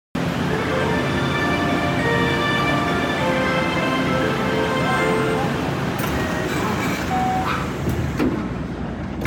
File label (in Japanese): 発車, train sounds; 桃谷